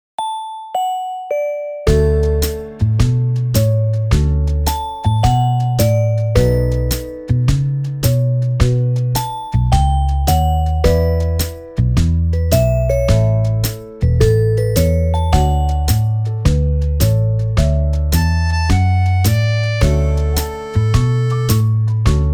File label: Romantic Swing